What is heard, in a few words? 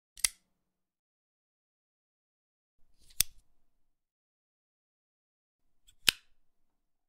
flick knife; چاقو ضامن دار